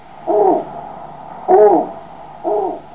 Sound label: Nyctea scandiaca; Fjälluggla; Snowy Owl; Bubo scandiaca; Niels Krabbe